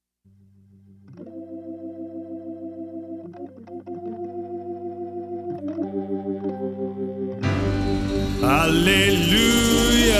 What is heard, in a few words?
Gospel Acclamation